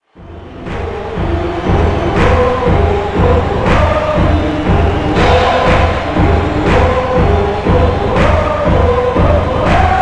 Chants, Walk Of The Valkyries

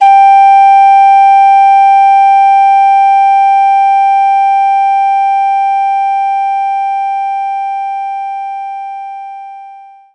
Ocarina G5; knights try

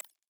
Ui Popup button hover

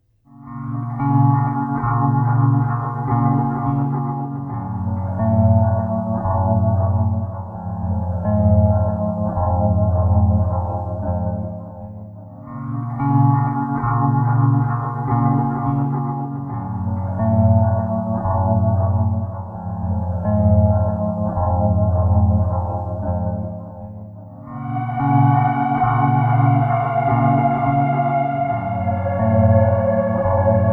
Tangin (Instrumental); Silent Hill 4 The Room

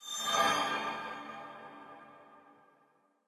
SFX Power Healing Potion Loop End

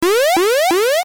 alarm; Robot Wants Fishy

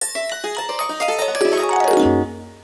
Ice Bin Gummy Bears fill; Fisher Price Ready for School Kindergarten

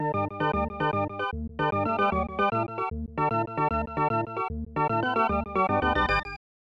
Fanfare Happiness; super bases loaded 3; snes

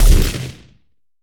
Sci-Fi Weapons-Assault Rifle-Single Shot

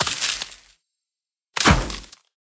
Apex Shard Spawn; Barbarians

